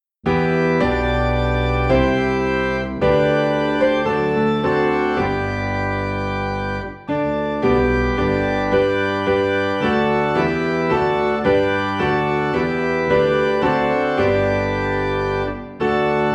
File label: Piano and Organ in G; All Hail the Power